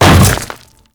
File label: Hammer Boss Step Dirt, footsteps